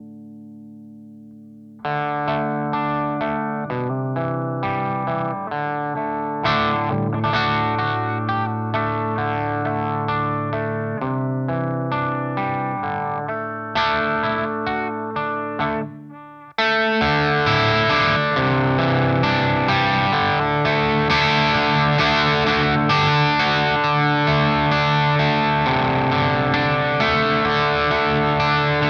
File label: Distortion Boost parallel